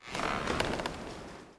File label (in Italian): Albero, natura